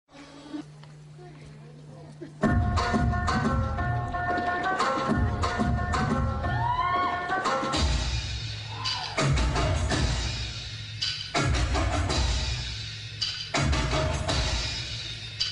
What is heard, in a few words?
Nyc Ringtone